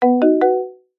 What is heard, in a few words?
game tone marimba select action